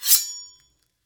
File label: knife draw